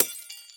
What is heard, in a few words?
glass break